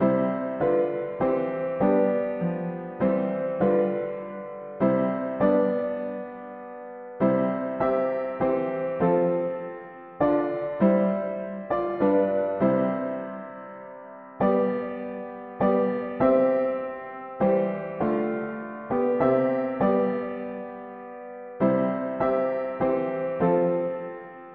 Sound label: mono piano, hymns; Christ the Lord will come again